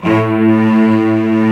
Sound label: STR STRING, Orchestral Strings